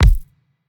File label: build large; factorio; Factorio 0.14.18